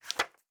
Book Page; Fantasy Interface; Fantasy Sounds Bundle

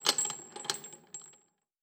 Chain; Fantasy Sounds Bundle; Craft

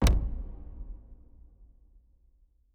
UI Click; Fantasy Sounds Bundle

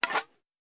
Weapon Draw Wood; Fantasy Sounds Bundle; Medieval Combat Sounds

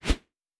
Whoosh; Fantasy Sounds Bundle

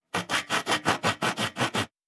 Saw Wood; Fantasy Sounds Bundle; Craft

